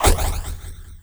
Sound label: glaives; weapons; dota; luna